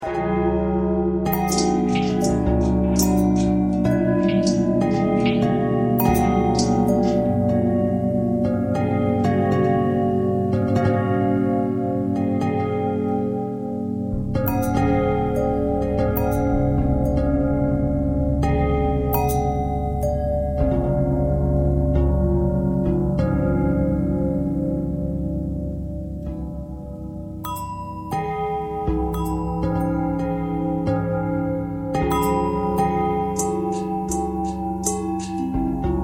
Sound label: long bell bell thread pentatonic, bells